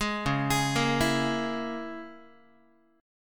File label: C sharp Minor 6th C sharp, Guitar; C#m6; arpeggiated; JGuitar